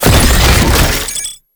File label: Tank Drone Deploy; Enemies; Abilities; Warframe; Lasrian Tank